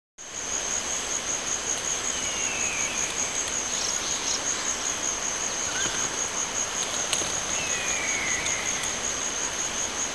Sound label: Noah's Park Ambience; Mega Man X8